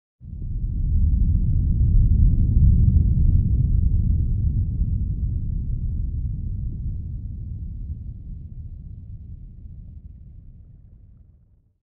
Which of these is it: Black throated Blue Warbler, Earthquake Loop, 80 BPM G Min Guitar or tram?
Earthquake Loop